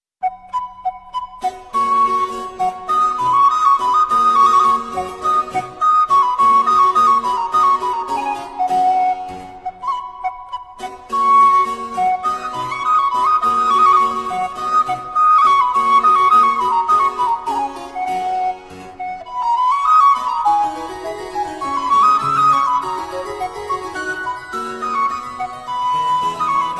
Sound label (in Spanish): gavotta flauta; corelli